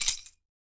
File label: YAQUI SIST, PERC; AKAI S6000 CD-ROM - WorldSounds